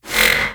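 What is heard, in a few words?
big hammer, attack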